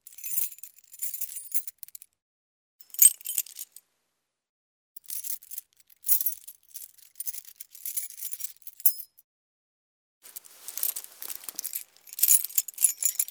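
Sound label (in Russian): zvon kljuchej, jingling keys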